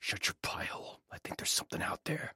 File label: male hate sense